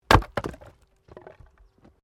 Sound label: Wood Log Fall; Material components for constructing more complex sounds; Materials